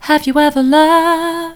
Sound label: vocal, soft